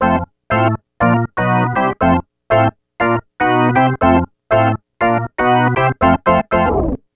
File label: Ditty; NBA ShootOut 2001; PS1